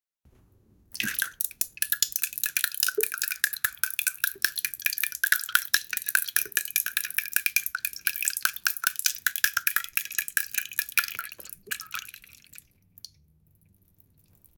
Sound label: beduk sound for breaking